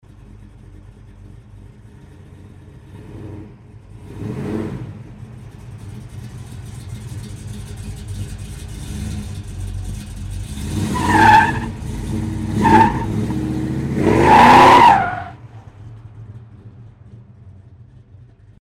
zavodka otezd s vizgom

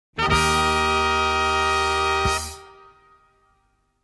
Muzykalnaia vstavka

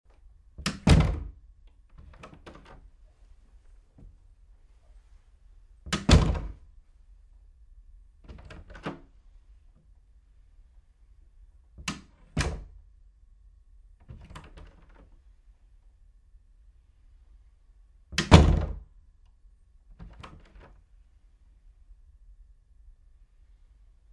dver v spalnju serija otkryvanij i zakryvanij

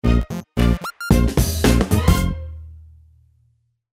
Otbivka

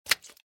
shhelchok nozha; Звук ножа; Звук метания ножа

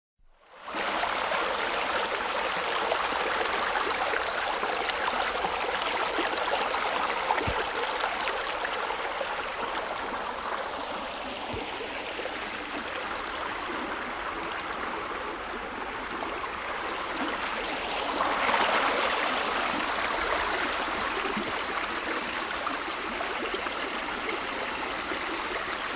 Spokoynye volny

